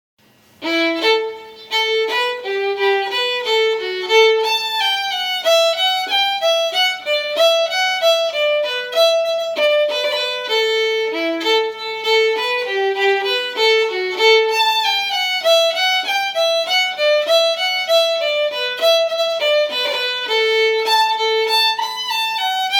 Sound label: slow, fiddle